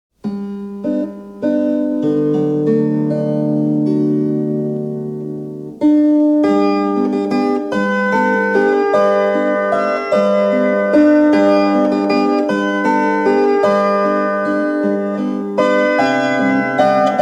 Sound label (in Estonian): Instrumentaal - Valss; Eesti Filmimuusika; Eesti Kullafond 1